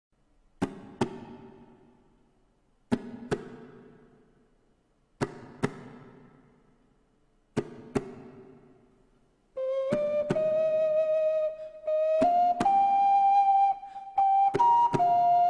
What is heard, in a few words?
quartet; tes3m